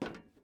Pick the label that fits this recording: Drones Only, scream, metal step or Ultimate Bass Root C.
metal step